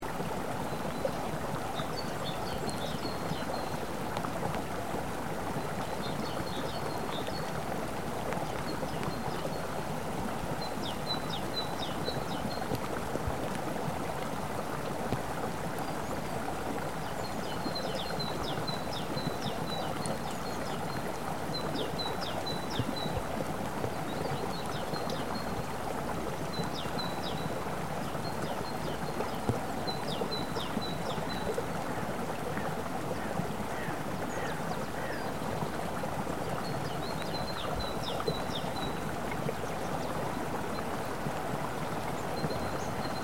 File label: Stream